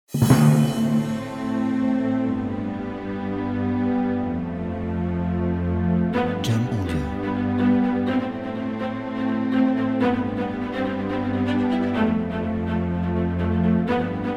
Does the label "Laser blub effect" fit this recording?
No